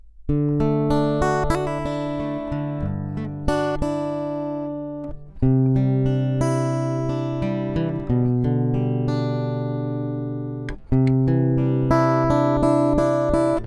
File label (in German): HarleyBenton GS Travel E Mahagoni; Harley Benton GS-Travel-E Mahogany